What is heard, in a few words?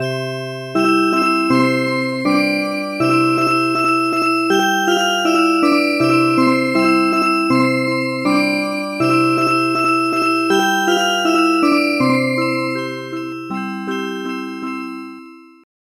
Music Box